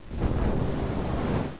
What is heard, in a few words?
flame